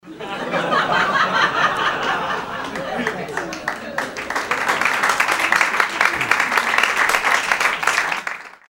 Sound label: applause with laugh